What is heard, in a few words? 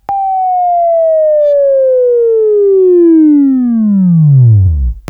Buzz; Labview-Program; Sweeping Training program